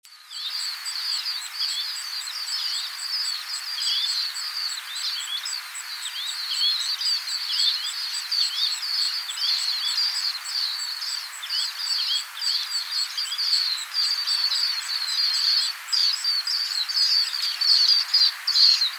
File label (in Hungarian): alaszkai partfutó hangja